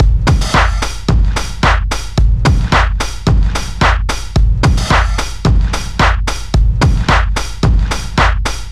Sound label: groove boy 110bpm